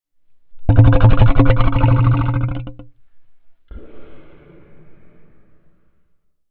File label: Monster Guttural Growl Dry